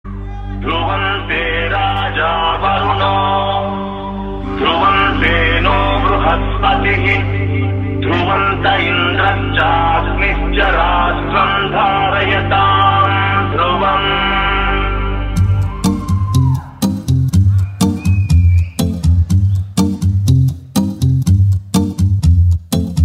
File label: Violin; iddarammailatho